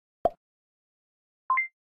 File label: ui; Boomerang All Stars - Super Goalie